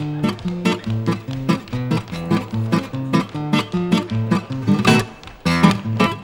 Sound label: STL STRN BRK; Best Service; World Colours